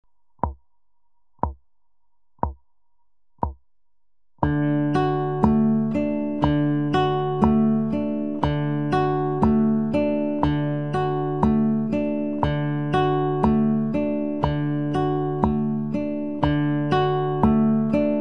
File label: picking; Picking Nr. 3